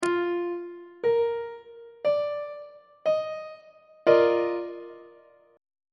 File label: e flat major 7th suspended 2nd 1st inversion on treble clef